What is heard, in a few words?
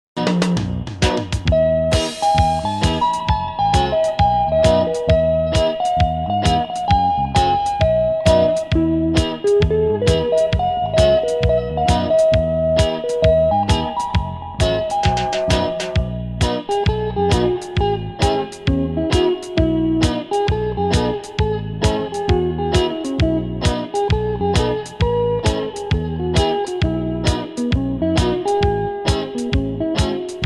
regga